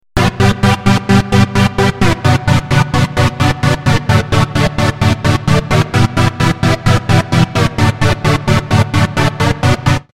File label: pad